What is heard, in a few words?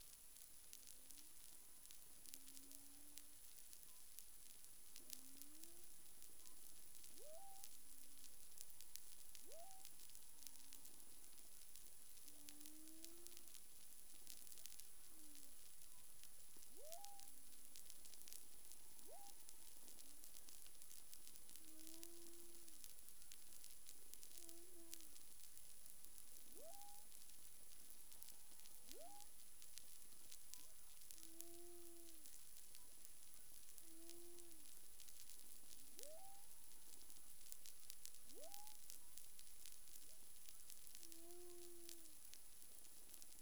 humpback whale; CARIMAM